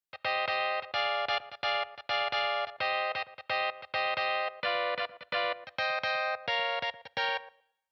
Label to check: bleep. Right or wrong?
Wrong